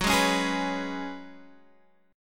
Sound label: Gb Suspended 2nd Suspended 4th